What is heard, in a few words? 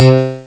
Long Slap, Amiga Soundtracker; ST-XX Amiga Soundtracker Sample Packs